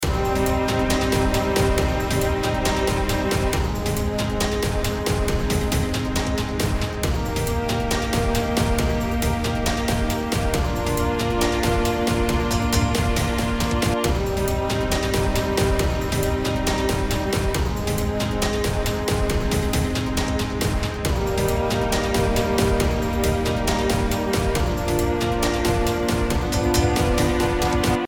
コード、リズム

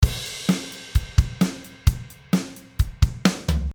drumフィル

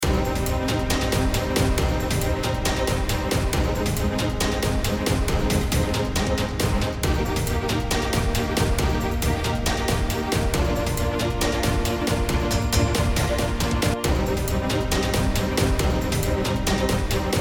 コード刻み、リズム

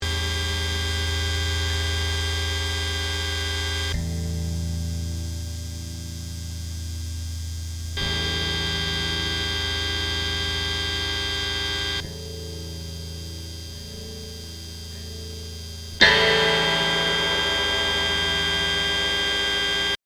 ピックアップノイズ

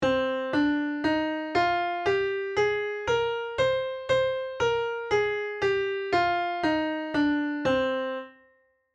Cマイナー・スケール

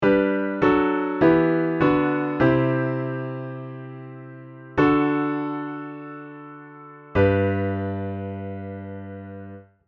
エンディングパターン

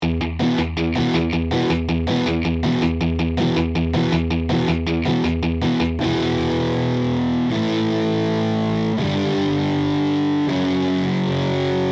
ギター音源; Protools

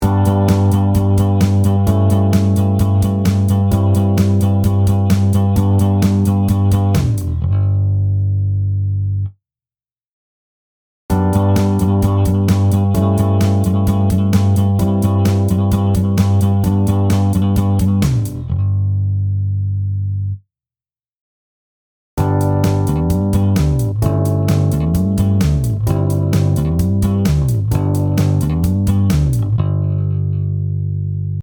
ベースフレーズ

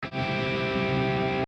ギター CEG

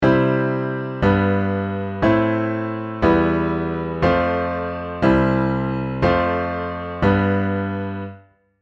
カノンコード

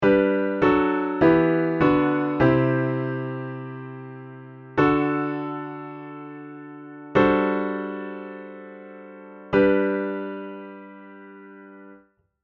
エンディング パターン 分数 コード

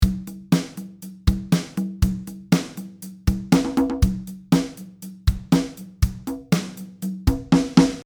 パーカッションチューニング

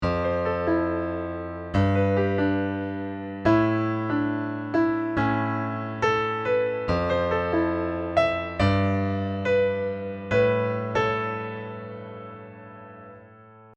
ベースとフレーズ反行イントロ

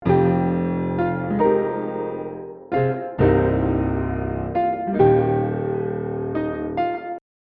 ピアノ

